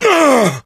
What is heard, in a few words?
melee swing, player survivor voice